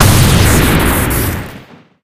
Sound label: diamond back crit, weapons; tf